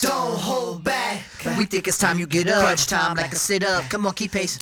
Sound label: Chorus Vocals; Multitrack